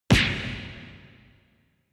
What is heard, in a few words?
Hit